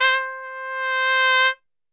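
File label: C 3 trumpet; Best Service; Brass Super Section; TRP SWL; 90 s Sample CDs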